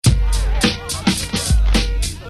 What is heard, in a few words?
BEAT